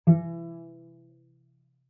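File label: Cello pizz fortissimo sul G E3; MIS Pitches - 2014; Strings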